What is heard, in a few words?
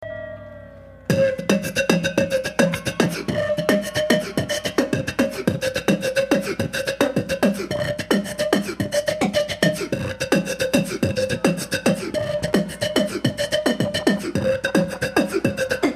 radio solo